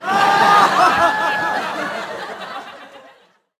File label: crowd laugh